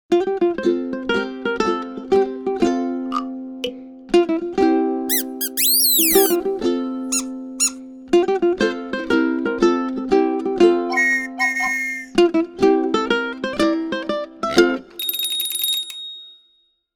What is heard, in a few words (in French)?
Balade avec echo